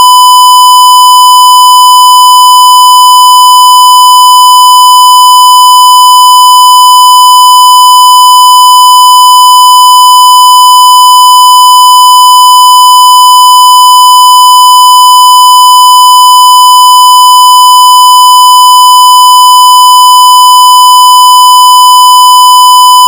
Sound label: 1kHz square wave; 192k; 24bit